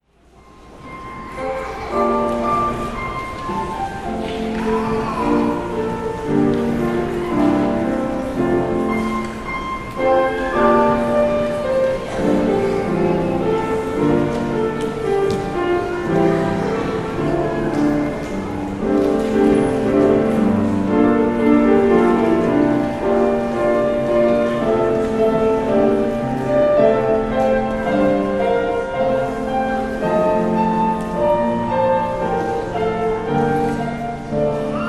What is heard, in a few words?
Violin and Piano